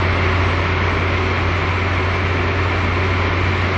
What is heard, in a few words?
Riding In Car Rumble